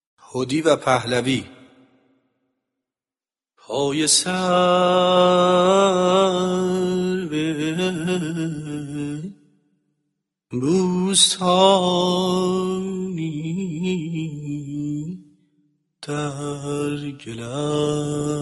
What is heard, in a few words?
hodi pahlavi, seghah